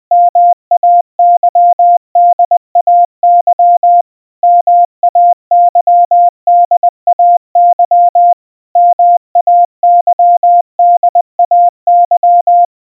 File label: MAYDAY, -- .- -.-- -.. .- -.--, Морзе; Голосовой сигнал бедствия (3x); Генератор азбуки Морзе